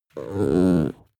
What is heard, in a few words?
chihuahua growl soft